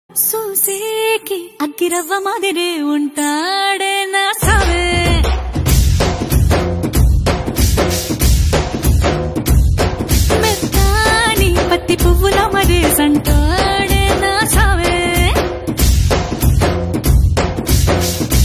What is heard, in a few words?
telugu ringtone; pushpa 2